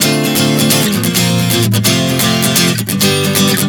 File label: Dm7-Bb-F-G 130 bpm, acoustic guitar chord progressions